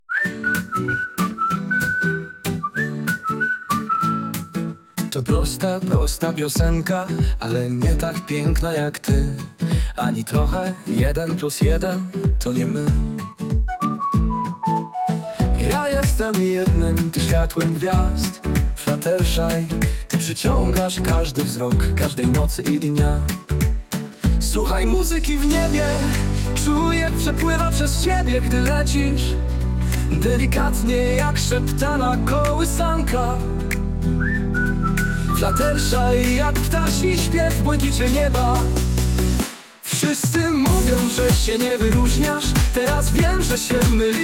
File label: Birdsong; fluttershy